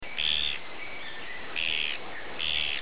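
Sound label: Japanese pygmy woodpecker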